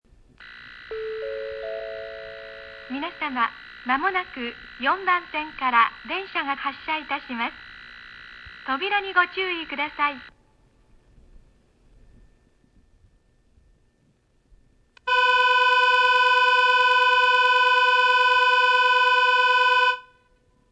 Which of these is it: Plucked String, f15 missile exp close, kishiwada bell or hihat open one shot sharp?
kishiwada bell